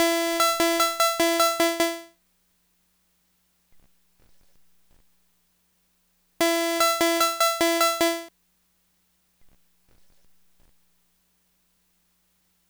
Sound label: Synth; Hip Hop Philosophy; big fish audio; Apple Loops